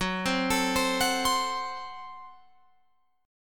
Gb Diminished Guitar Open F

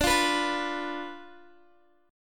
D Minor 6th Guitar Open G

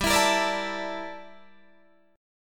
G Minor 6th Add 9th G down Guitar Drop D

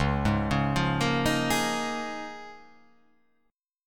Db 6th Add 9th Db down; Db6add9 arpeggiated; JGuitar

